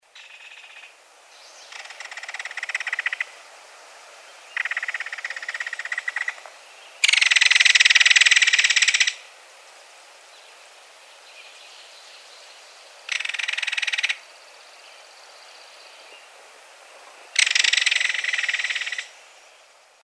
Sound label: Belted Kingfisher